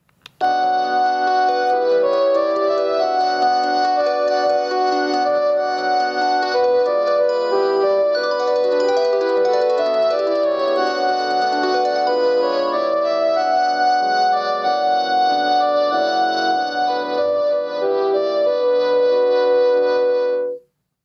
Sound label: panasonic ringtone